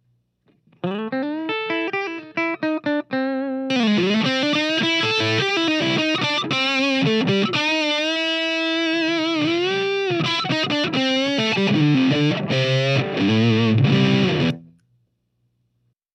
Some Bends, TopFuel pedal

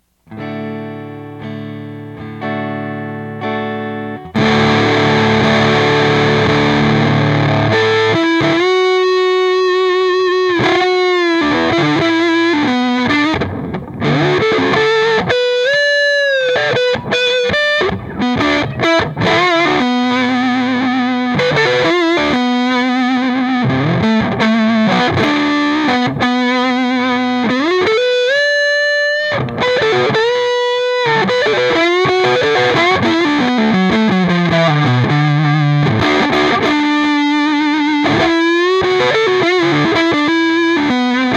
Solo, TopFuel pedal

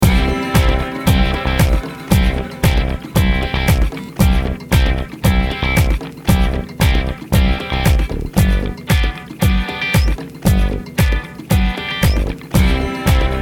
Slap; delay; Skreddy

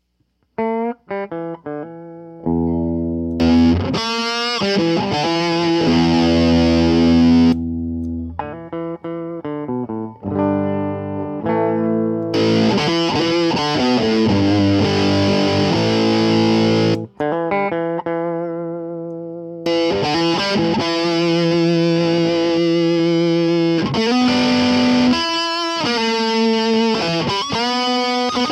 Riff

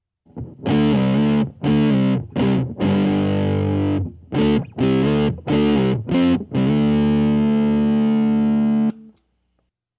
Lil Chop

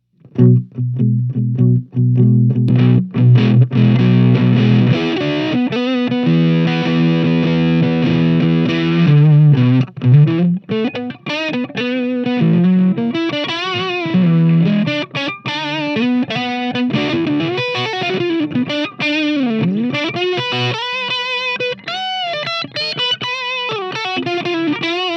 Blues

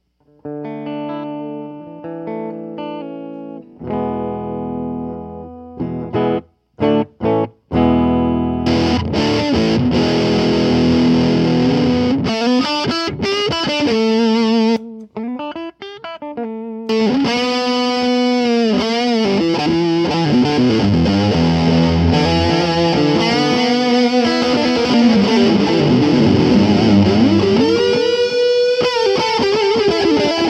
Higher Gain Fun; w-DDL10

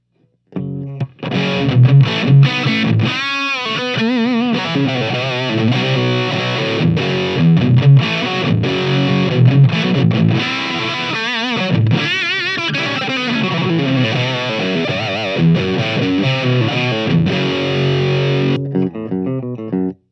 Mean Over Drive; ScrewDriver

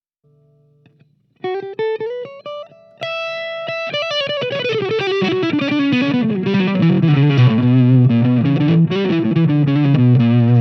Fast Chop

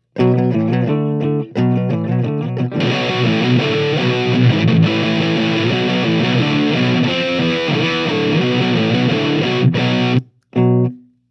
Heavy Screw; ScrewDriver

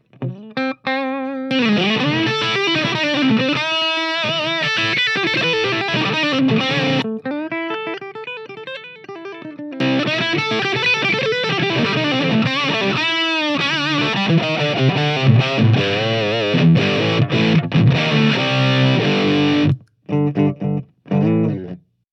Screw Drivin Leads; ScrewDriver

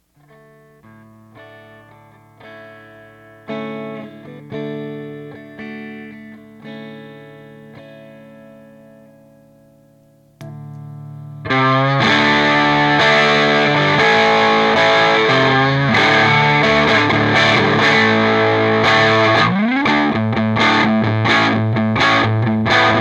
Touch Sensitive, ScrewDriver pedal

